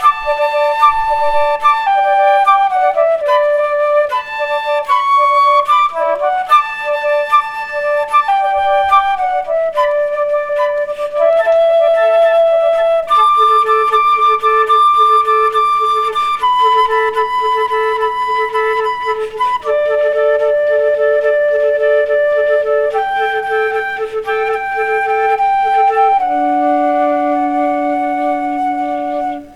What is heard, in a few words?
flute end; afar; flute-synthesis